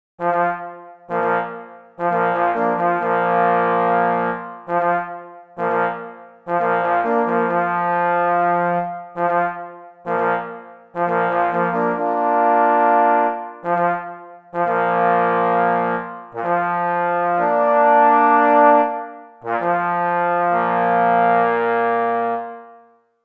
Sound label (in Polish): Kuropatwa na rozkladzie na 3 parforsy; K.M.Kroskowski